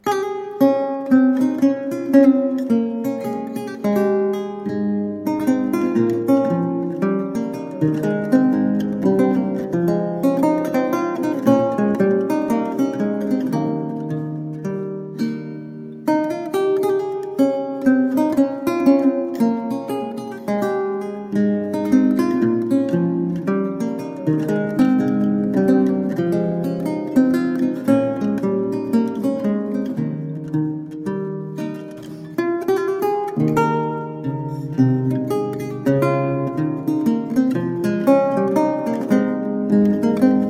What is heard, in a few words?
Courante in A Major; Les Larmes of Johannes Fresneau